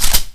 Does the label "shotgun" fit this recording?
Yes